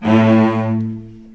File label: A1 AT LNG R, VCS ARCO, String Collection; East West; The Ultimate String Collection